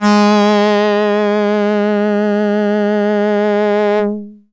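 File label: Box Cello DX100 G sharp 6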